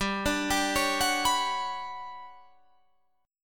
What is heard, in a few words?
G Major Sharp 11th G down Guitar Drop D